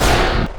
enemy destroyed; TumikiFighters

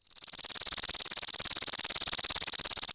bird; DAoC

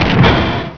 BOMB; XWINGTIE

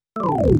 SYNTH HOUSE 125 A, Keys; eJay

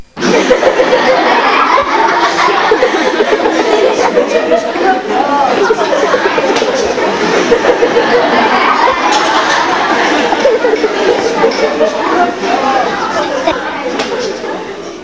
laughing; people

